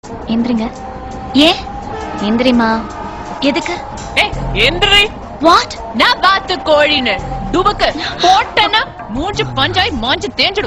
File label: Shouting